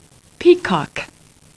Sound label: Sound peacock